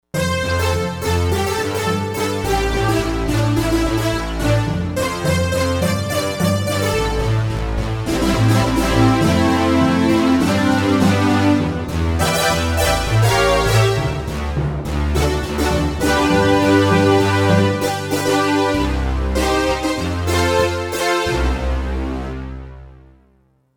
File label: Brasstron